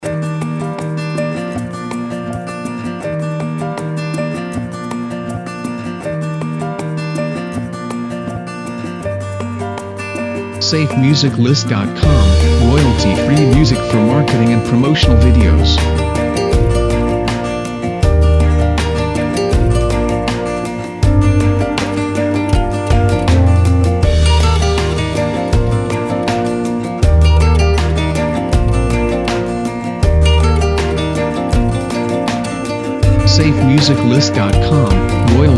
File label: Positive And Warm; SafeMusicList